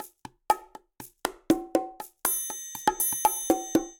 Latin Fusion, Latin Percussion; Ableton

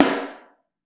Snare Mono

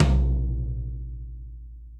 Tom Floor Room With A Vista; Drums; Drum Hits; Ableton; Factory Packs; Session Drums Studio; MPE Hits

